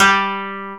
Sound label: KOTO G2 -R; USB Soundscan vol.60 - Asian Traditions; AKAI